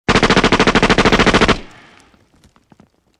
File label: gun uzi; VAPEN; SKOTT - SKRAMMEL